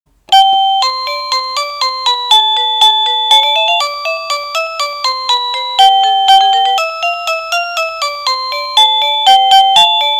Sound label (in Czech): melodie